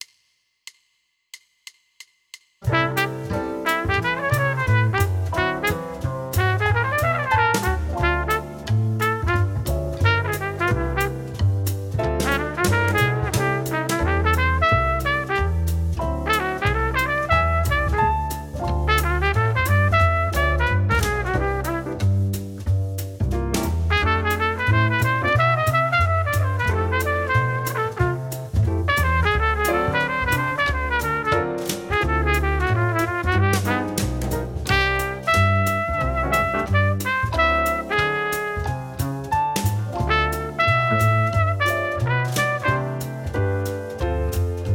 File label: Jazz Etude; Jazz Etude #6 Trumpet and Track